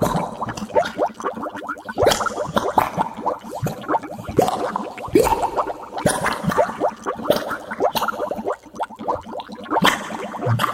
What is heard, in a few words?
quicksand; Golden Crayon Sounds